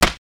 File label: hit armor; Damage; Barotrauma; Subsurface